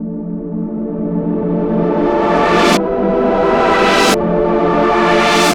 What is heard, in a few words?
Tense Keys, Drum n Bass; KJ Sawka; Drum n Bass & Beyond